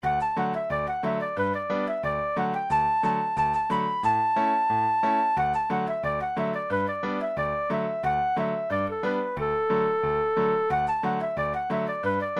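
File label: Julianne Johnson 90bpm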